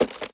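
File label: reload movement; valorant; araxys operator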